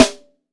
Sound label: MAPLE SNARE